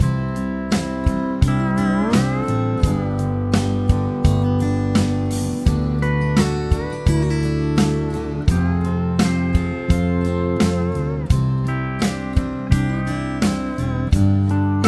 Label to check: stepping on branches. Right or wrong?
Wrong